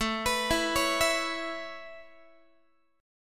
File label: A Major Add 9th A Guitar, chord; x,17,14,14,17,15; Aadd9 arpeggiated